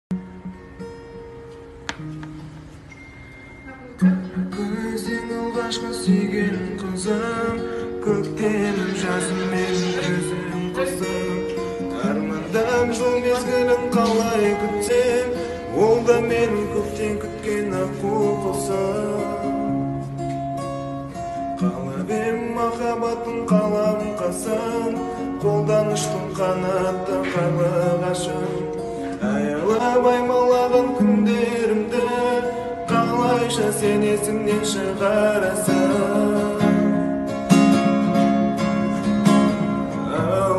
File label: cover gitara